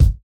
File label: Hiphop kick; Reverb+EQ+Comp+Limit